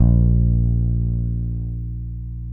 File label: BASS A#0, Electric Basses; PlatinumPhattCD2; E-MU Producer Series Vol. 8 – Platinum Phatt